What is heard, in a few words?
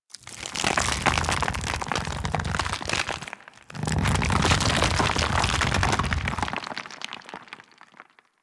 Golem Grenade Death Retract; Warframe; Foley